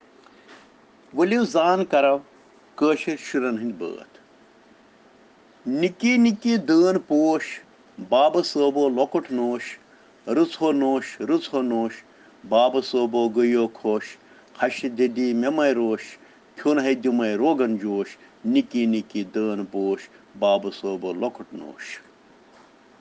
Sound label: Children Rhyms in Kashmiri